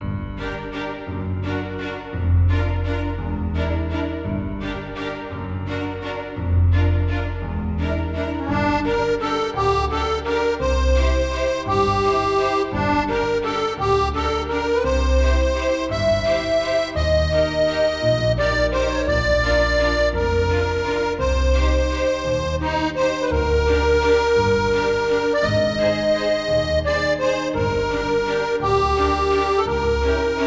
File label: Characters Theme Chaotic Evil Orchestrated; Video Game Music